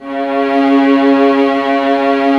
violas C#3, String Ensembles; Miroslav Vitous; VLS 1+2

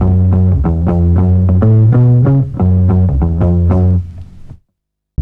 Funk Bass, Drum & Bass; Fluid Dynamics

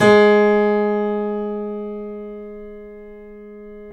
PIA GRND, Grand; Sound & Vision; Gigapack I CD 2; PIA GRAND 1

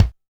Kick, TR626

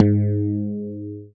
BAS FM DX-7

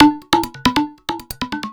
percussion loops 138; PS-45

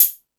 Hip Hop, Drum Machines E-MU Pro-Cussion

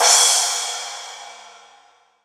Paiste, ALL DRUMS; Best Service; Alpine Volksmusik

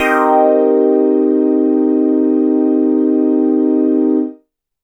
OB-6 HOUSE CHORD; M8

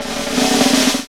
snare, hip-hop rap, Roland; Northstar; Drumscapes Roland

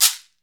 CAXECERE, Latin Shakers

